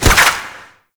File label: Bunraku String Catch; Warframe; Koumei